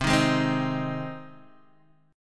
C Minor Sharp 5th, Guitar, DADGAD; down; chord shape

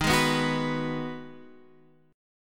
Ab Minor 7th

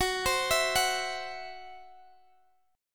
E Suspended 2nd Sharp 5th F sharp

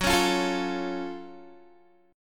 Gb Minor Major 7th Gb, down, Guitar; GbmM7 strummed; 4E,4A,5D,5G,5C,6F

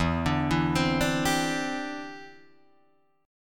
E Minor 7th Sharp 5th, down, Guitar, Drop D

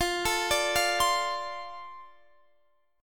F 6th F down Guitar DADGAD; F6 arpeggiated

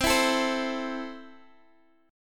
C 6th C down Guitar; strummed

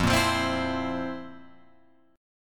F Major 7th Suspended 4th, Guitar, Open F; FM7sus4 strummed; JGuitar

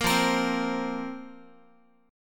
F Suspended 4th F, Guitar; Fsus4; strummed; 13,13,13,10,x,x

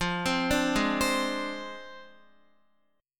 F Minor 6th F down Guitar; arpeggiated; tuning 4E,4A,5D,5G,5C,6F

